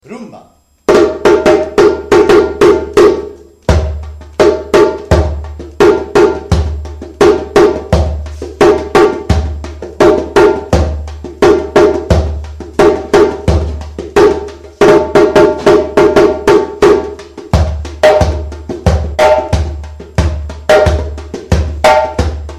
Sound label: Rumba